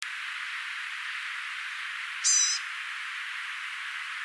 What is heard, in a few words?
Blue gray gnatcatcher